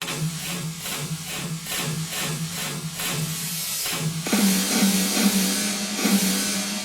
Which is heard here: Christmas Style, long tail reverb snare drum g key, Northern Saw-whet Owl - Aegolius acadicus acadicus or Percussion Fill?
Percussion Fill